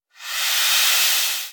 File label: Rattle Snake; rabbids multiverse; iOS, macOS, Mobile